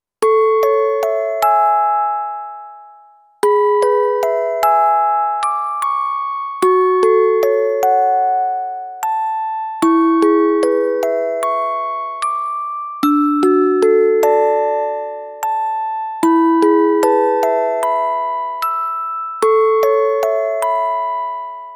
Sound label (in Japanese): オルゴール; コミックデイズH